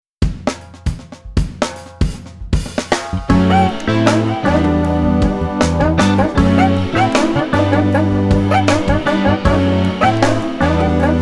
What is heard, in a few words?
cuica cuicando